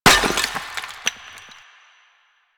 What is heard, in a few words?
Destroy Planter Hanging; Warframe; Ambience; Nineteen Ninety Nine